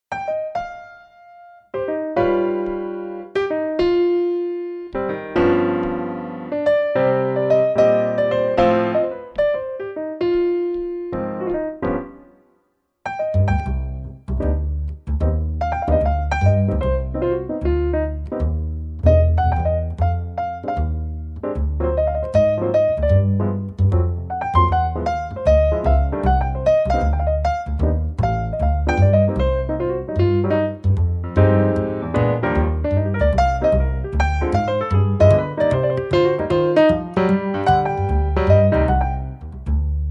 minus drums

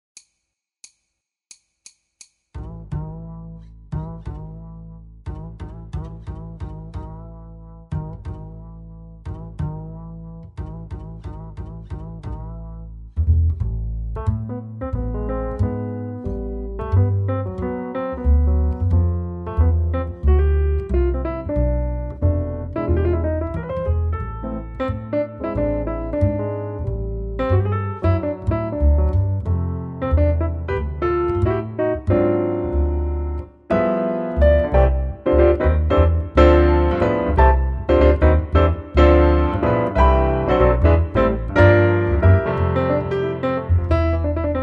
minus drums

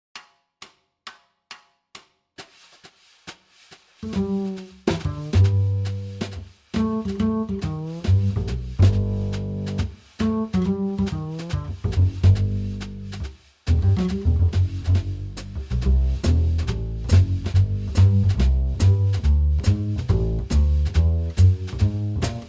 minus piano

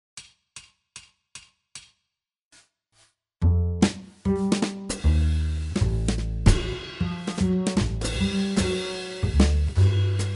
minus piano

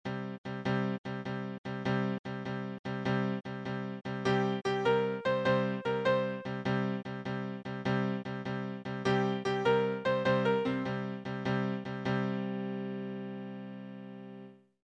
power chords

